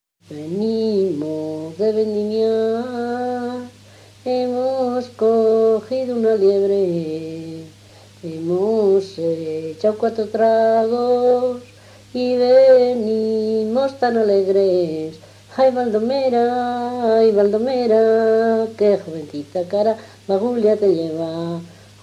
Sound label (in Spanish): Jota de vendimias; Villabrágima; Ay!, Baldomera